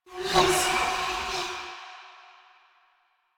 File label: Generic Foley; Warframe; Choir